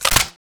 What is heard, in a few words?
clip insert; svencoop